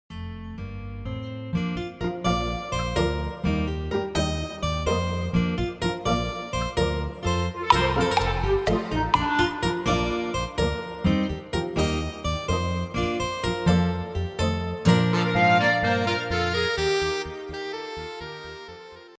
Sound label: Instrumental